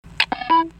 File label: click